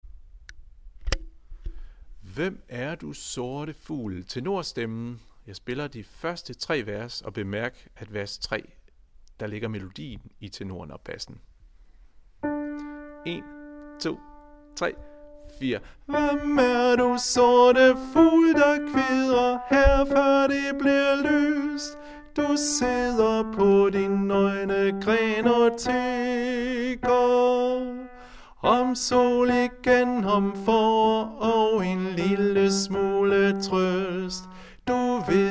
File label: tenor; harlev koret